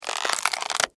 Crack Crunch Celery, Texture, Crack, Crunch, Celery; Twist, Stalk; Food